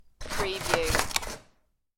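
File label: Scissors Quick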